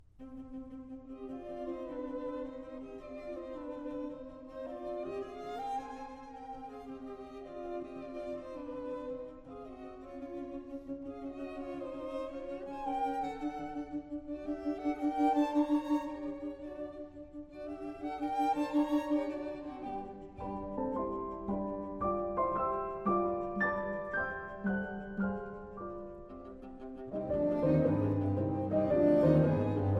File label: Piano Quartet in G Minor, Intermezzo-Allegro ma non troppo